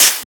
Open Hat; FLPRO

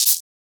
Shaker

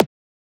Transient; FLPRO

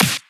Snare Clap; FLPRO

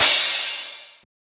Crash

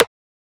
Transient; FLPRO

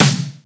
Snare; FLPRO

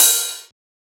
Ride; FLPRO

